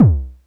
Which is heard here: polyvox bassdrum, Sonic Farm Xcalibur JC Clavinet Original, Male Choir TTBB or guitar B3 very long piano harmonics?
polyvox bassdrum